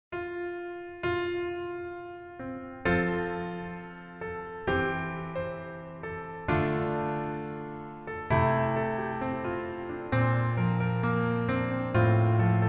Piano ver, 멜로디MR

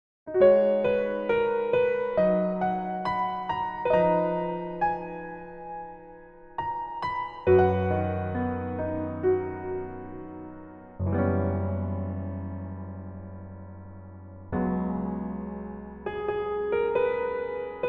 Piano ver 멜로디MR -1키

